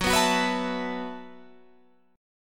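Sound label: B 7th Suspended 4th G flat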